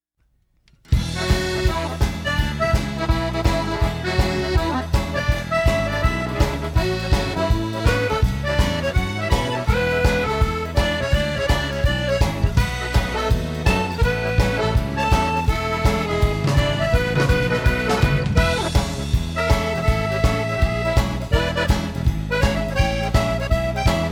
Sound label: Cajon; CVS